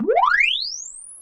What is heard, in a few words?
sweep, SCIFI; Techno Trance Essentials